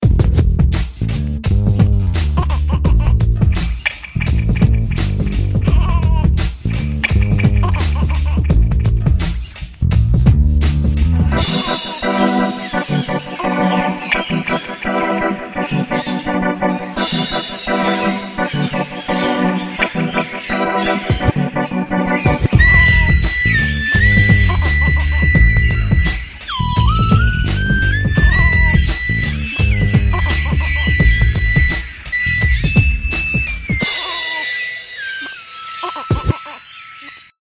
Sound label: baby dub